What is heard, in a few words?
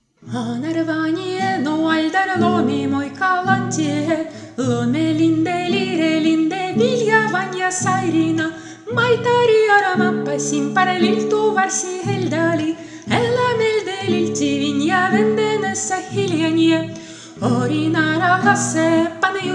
Elven Polka